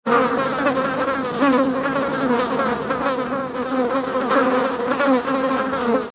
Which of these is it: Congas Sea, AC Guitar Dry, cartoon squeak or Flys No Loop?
Flys No Loop